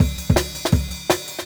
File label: Jungle Breaks